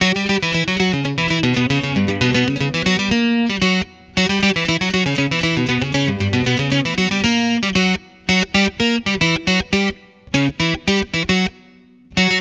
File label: guitar, stringed instruments